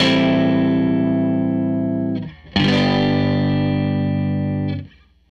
Strat Guitar Loop

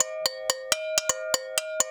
Percussion Loops, Brazilian; Houseworx

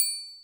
Triangle, Alesis D4 drum machine

